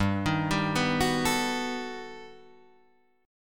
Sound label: G Augmented Major 9th, Guitar, Open D